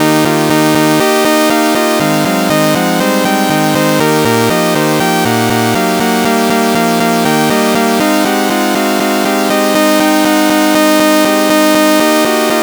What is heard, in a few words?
sawtooth major blues; Major Blues Scale; music generation library in Rust